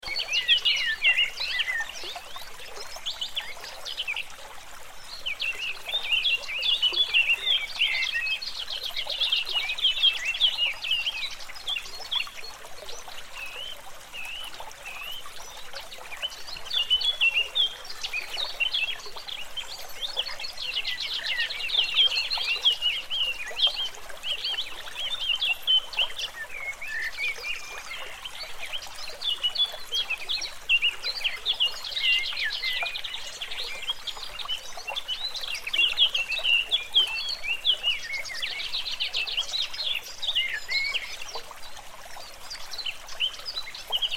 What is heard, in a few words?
River ambience light wind in the trees and birdsong